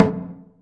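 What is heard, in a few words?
KOREA DRUM, KOREAN PERCUSSION; AKAI S6000 CD-ROM - Volume 5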